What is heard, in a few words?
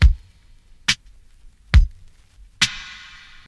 69 bpm 80s breakbeat sample B key, Free drum groove - kick tuned to the B note.